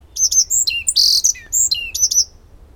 bunting black-faced Emberiza spodocephala spodocephala